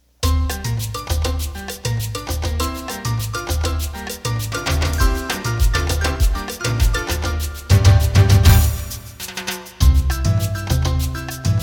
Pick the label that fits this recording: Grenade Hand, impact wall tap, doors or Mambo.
Mambo